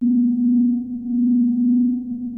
JUNO 60 G2; Hollywood Edge - Giorgio Moroder Rare Synthesizer Collection

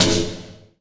Oneshot; Sonic Foundry (Sony Creative Software); Esoterik Beatz; Quesy

